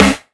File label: raw snare single hit g key